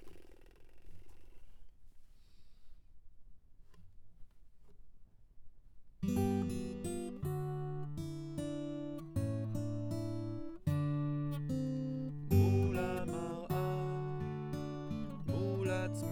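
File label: guitar A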